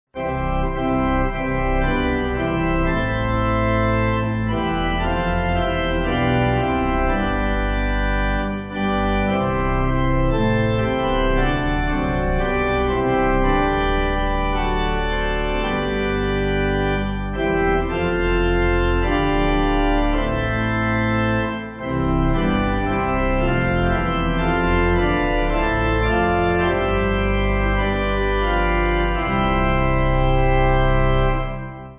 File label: Pipe; Darwall